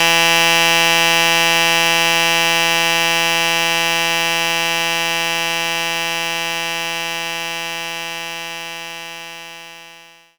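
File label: Guitar Click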